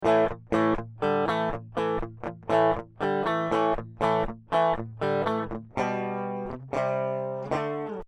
gtr rhythmus unbearbeitet

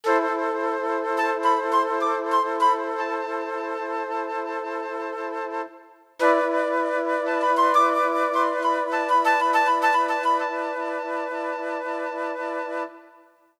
startone mk-300 floete

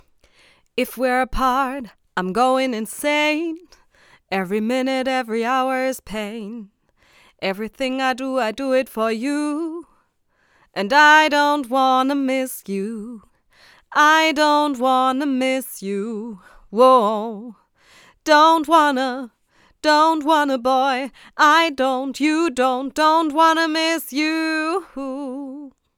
gesang f; audio technica at4033asm

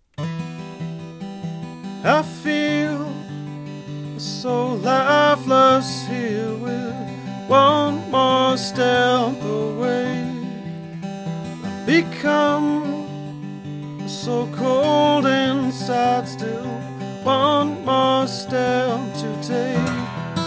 gitarre seitlich winkel 30grad; Singer-Songwriter; Mikrofon; Studio; Nur ein Mikrofon? Singer-Songwriter aufnehmen im Studio

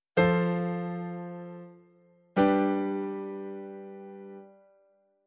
unvollkommene verbindung; songwriting

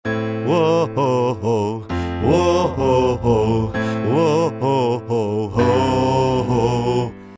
insert oktave gemischt; Verdopple die aktuelle Stimme

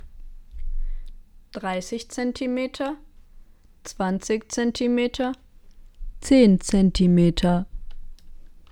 R-122 MKII Vocals Nahbesprechung; royer

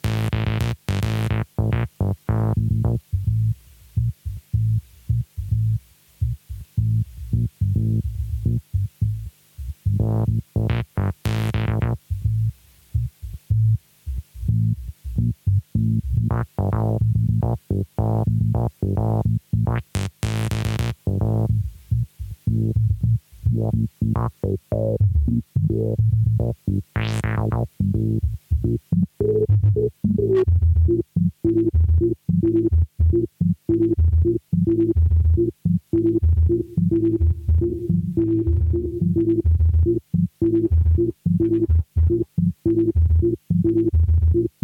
m-audio venom klang

